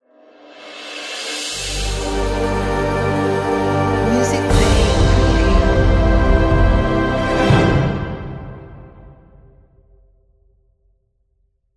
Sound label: Cinematic Logo